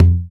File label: African Perc